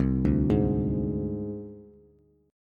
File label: C Suspended 2nd Sharp 5th, down, Bass; Csus2#5 arpeggiated; JGuitar